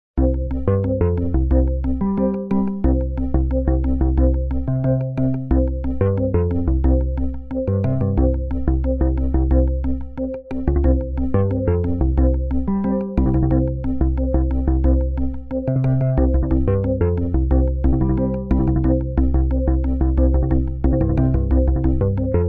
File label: Motorola Original Ringtone